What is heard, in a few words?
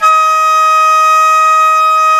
WND FLT MT, Flute & Mute